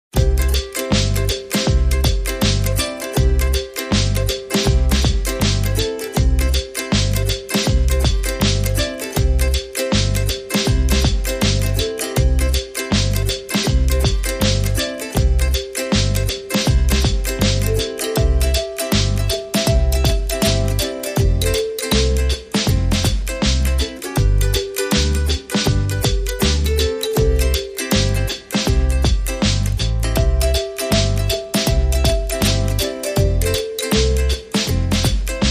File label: No Quirky Synth